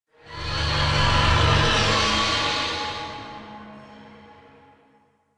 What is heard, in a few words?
Exterior Jealous Sky Screech; Warframe; Ambience; Duviri